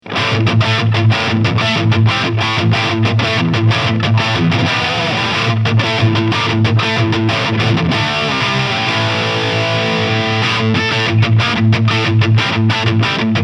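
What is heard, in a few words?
Epiphone Lead, guitar; JC Bone White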